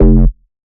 Bass F, Bass Synth; 99Sounds; Monosounds; Music Loops; Instrument Oneshots

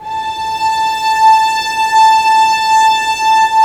STR SEC, Roland LA Composer, Sec Mute St

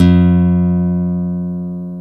GTR NYLON, Nylon Chorus; Roland LCDP02 Guitar and Bass; Nylon String

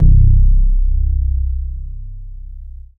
Dance Floor Bass, Multisample Basses

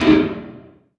Oneshot; Sonic Foundry (Sony Creative Software); Esoterik Beatz; Annoya

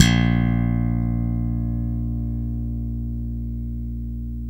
funk bass, brite thumbs; Roland LCDP02 Guitar and Bass

